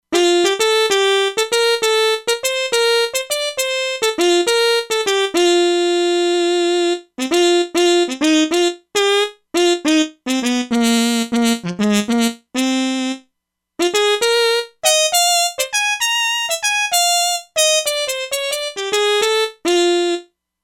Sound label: Harmon Mute; Factory Presets; E-mu Proteus-2